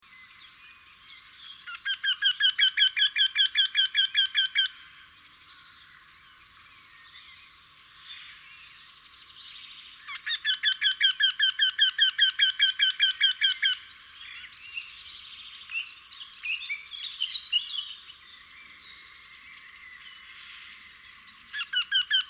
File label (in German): Wendehals; Landshut LA; Susanne Rieck